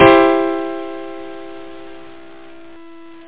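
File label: Grand Piano Maj4; Chords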